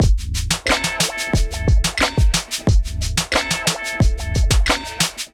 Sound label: Kit Melodic 90 bpm, Drums; MIDI Clips; Peace; Ableton; Chop and Swing